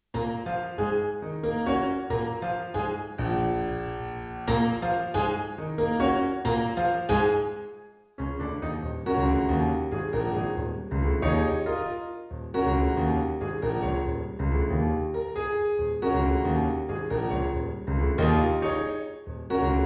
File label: piano pop